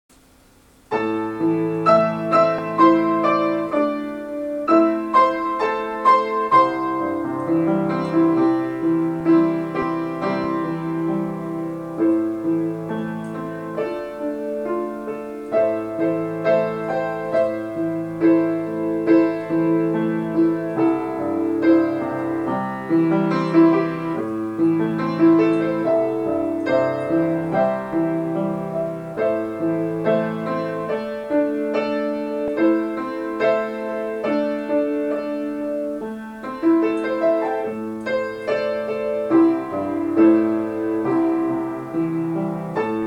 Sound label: hulusi; banzou